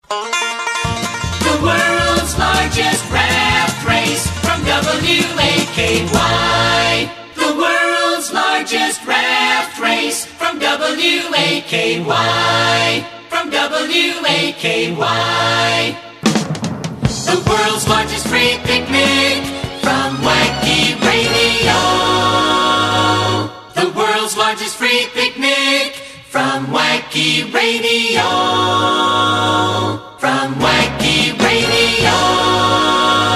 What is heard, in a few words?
Jingles; WAKY JAM Raft Race Picnic Jingles